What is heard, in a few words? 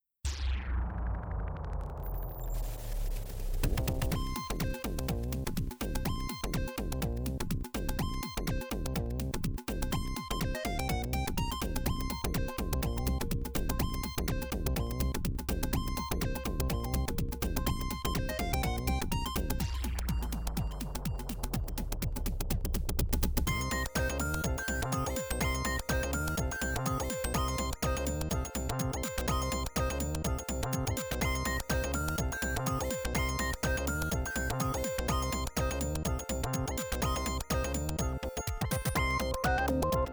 iYM2151